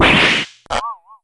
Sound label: damage; connor